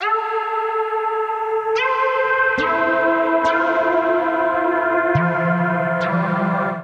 Dense String A Minor 140 bpm, pads; MIDI Clips; Tonal; Skitter and Step; Ableton Factory Packs

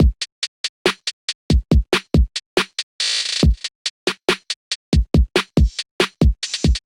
MPE Venture Kit 70 bpm, Trap Drums; Trap Drums by Sound Oracle; Ableton; Factory Packs

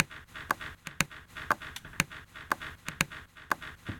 Inside a Piano Kit; drum racks; Ableton; Factory Packs; Mood Reel

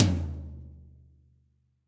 Tom Floor BrightRoom; Drum Hits; Standard Hits; Ableton; Factory Packs; Session Drums Studio

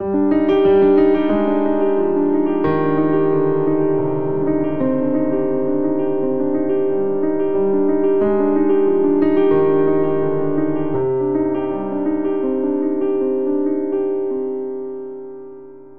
Ostinato Grave C Minor 90 bpm, Grand Piano; MIDI Clips; Ableton

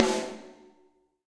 Snare Rim Low Loud Squashed; Drum Hits; Standard Hits; Ableton; Factory Packs; Session Drums Club

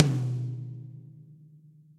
Tom Low Studio Basic; Drums; Drum Hits; Ableton; Session Drums Studio; MPE

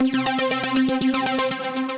Big Bro Lead, Synth Lead

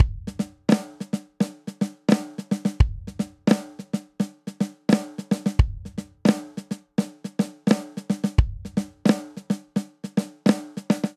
Inbetween Hip Hop 05 Breakdown 86 bpm, drum; Drum Booth

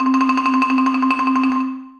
Marimba Rubber Rolls; Ableton; Orchestral Mallets

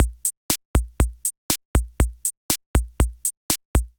C78 Kit groove 120 bpm; MIDI Clips; 111 - 130 bpm; C78 Bullet Kit groove; Ableton; Factory Packs; Drum Essentials

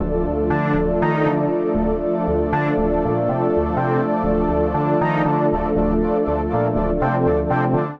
Power Pad (Dm) 120bpm; Music Loops; Slow Roll Power Pad; Ableton; Factory Packs; Singularities

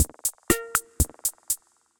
Kit-D55, Effected Drum Kits; Kit-D55 Samurai; Ableton; Factory Packs

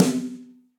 Snare & Press Multirod Dry; Drum Hits; Ableton; Factory Packs; Session Drums Studio; MPE Hits

